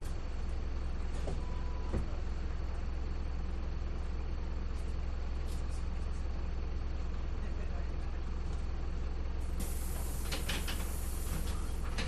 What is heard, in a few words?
glitched tones bus idle station newcastle upon tyne uk